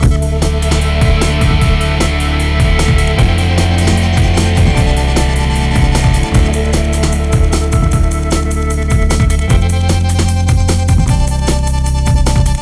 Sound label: Hardcore Breakbeat, 152 Dmin; Big Fish Audio; Hardcore Breakbeat Action